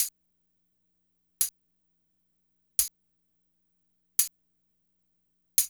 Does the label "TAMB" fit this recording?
Yes